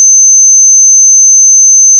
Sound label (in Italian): tono 6200Hz; acufene